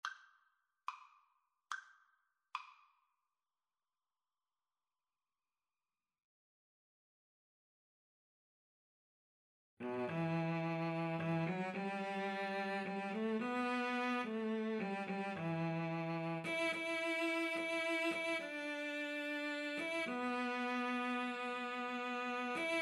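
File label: cello double bass, Cello-Bass Duet; house of the rising sun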